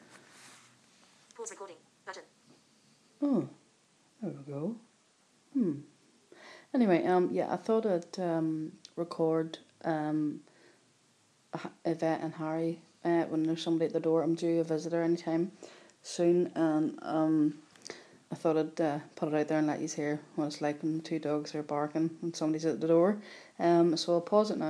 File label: barking